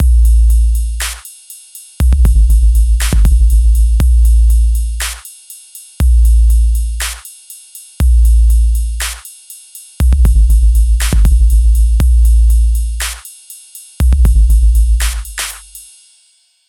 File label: DARK BELLS DRUMS; ONLEY DRUMS